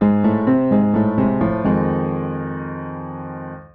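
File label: Jazz Keys Acoustic Piano 128 bpm Riff F; B-line n Riff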